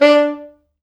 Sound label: D3, Pop Brass; Best Service ProSamples vol.19; AKAI